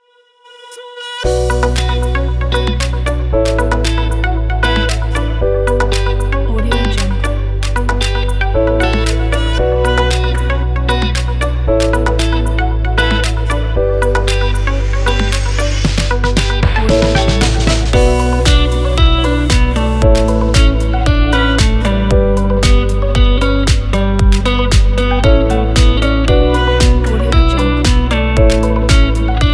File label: tropical house chill music